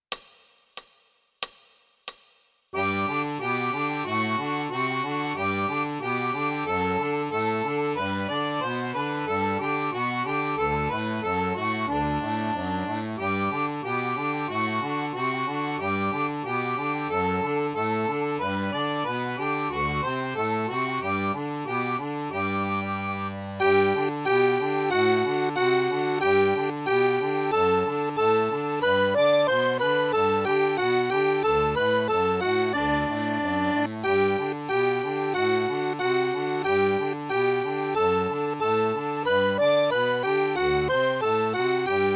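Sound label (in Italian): Czerny arpeggio, Fisarmonica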